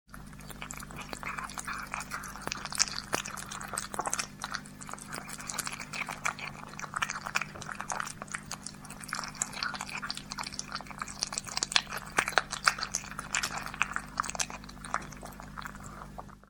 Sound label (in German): schmatz